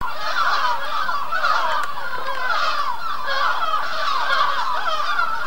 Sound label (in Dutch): meeuwen